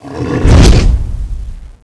attack, giant; titan

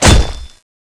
stone, weapons

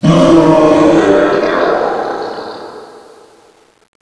dead, zombie; zm mod

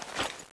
lightning draw, weapons

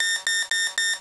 laser guide failed, weapons

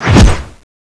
axe dual slash, weapons

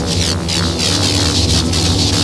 pain; lilith; gate

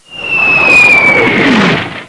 launch rockets; bynova

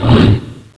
dash start

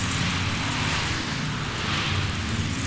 spear idle, weapons

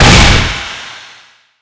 chainsr smoke, weapons

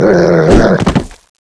zombie dog skill

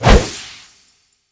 kf miss; drakar

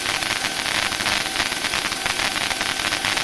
shoot fx, weapons

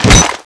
chainsaw slash, weapons

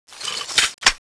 smg change, weapons

